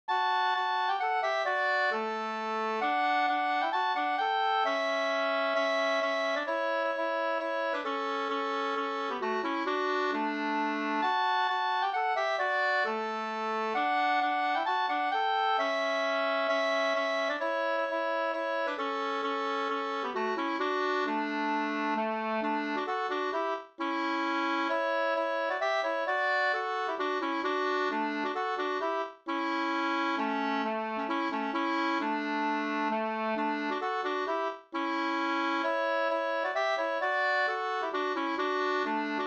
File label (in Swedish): hambopolska